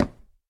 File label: wood, creaking heart place; minecraft 1.21.4; block